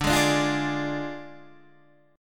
D Diminished Guitar Open D, chord

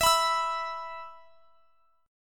E 5th E down; strummed

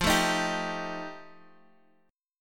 F 9th F Guitar Half Step Down, chord

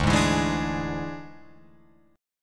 D Suspended 2nd Flat 5th D, Guitar, Open F; strummed

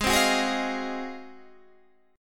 G sharp 13th, Guitar, Standard; strummed; JGuitar

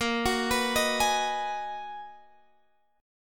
B Major 13th Bb, guitar

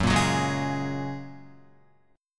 Gb Major Add 9th, down, Guitar; strummed; JGuitar

